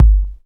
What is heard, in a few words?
Bassdrum Clean